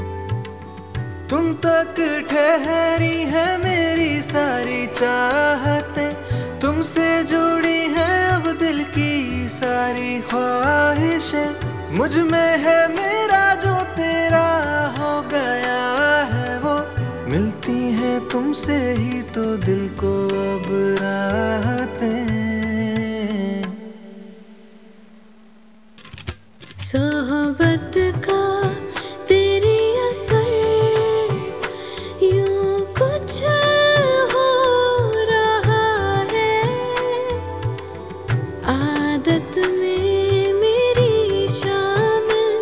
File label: Couple Wedding Entry Male Female